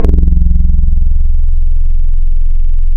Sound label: Steel Dream, wavetables; Waves Codex